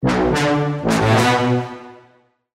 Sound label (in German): Soundlogo; MS Post Soundbranding; un.studios